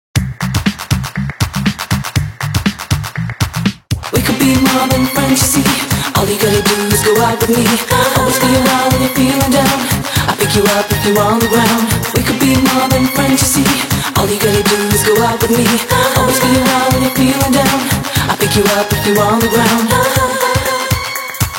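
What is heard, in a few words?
original ringtones Nokia 5310 XpressMusic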